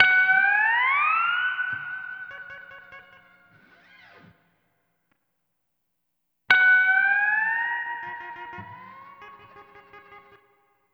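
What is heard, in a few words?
Steel Guitar, F#; Zero-G Kocktail Kollection; Construction Kitz (Lounge); Volcano at Sunrise 074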